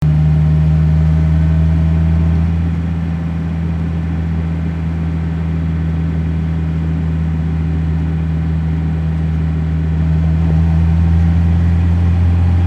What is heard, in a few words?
CAR RIDE